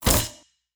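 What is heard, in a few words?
robot step; android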